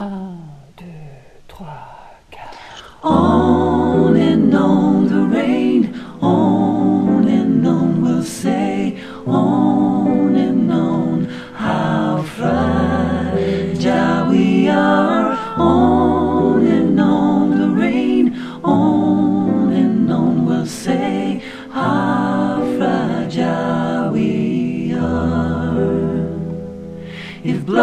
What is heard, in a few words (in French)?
chante sur piano; Tutti